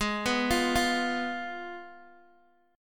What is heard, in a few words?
Ab Minor 6th Ab, Guitar Open G; x,x,6,4,6,3; down